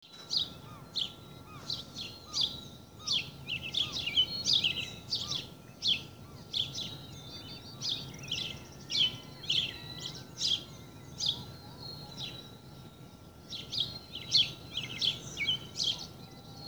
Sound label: Dawn chorus